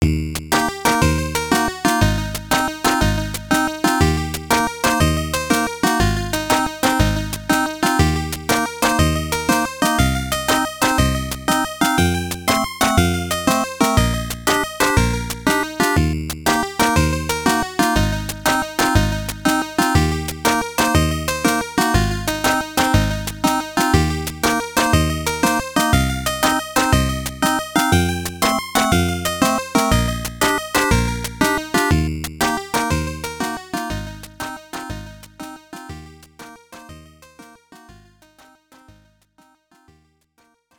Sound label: BGM, TurboGrafx-16; Urusei Yatsura Stay With You